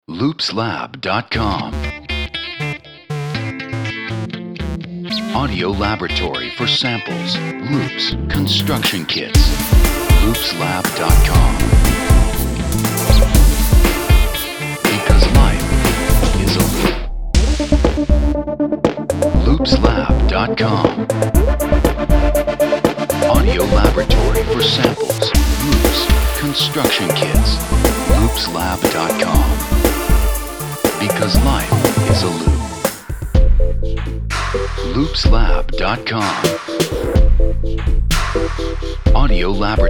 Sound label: free hip hop pop loops; Loops Lab